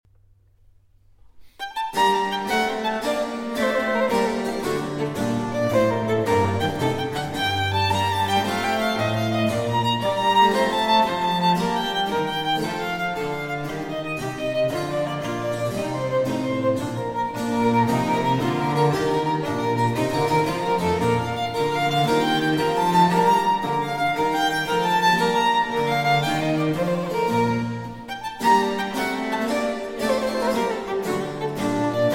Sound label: Sonata For Violin & Continuo in G Minor, Giga Allegro